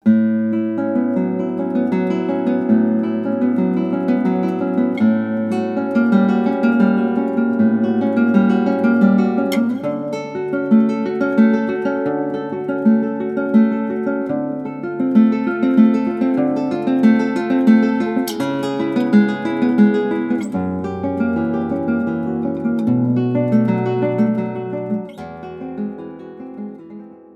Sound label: Preludio Brasileiro, Guitar; Gitaarboek; Guitar Recital vol.1